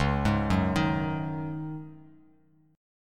C sharp Suspended 2nd Suspended 4th, C sharp, Bass Standard; arpeggiated; JGuitar